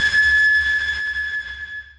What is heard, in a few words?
sonar Tail Water Medium Shuttle Directional; Barotrauma; Real Sonar